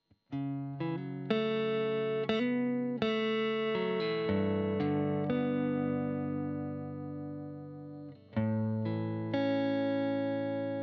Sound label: GTR Royer